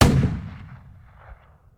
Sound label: Gun, Anti Tank, Single Blast; gun and weapon sounds; captain audio; fully loaded